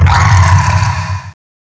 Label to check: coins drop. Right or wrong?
Wrong